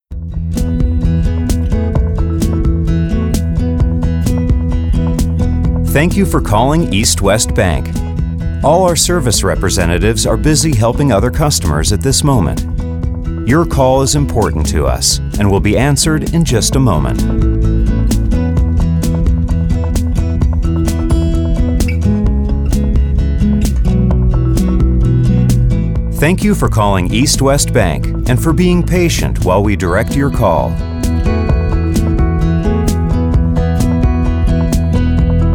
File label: On Hold Music